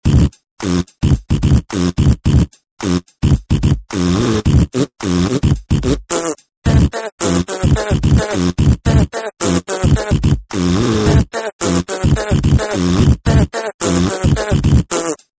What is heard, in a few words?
fart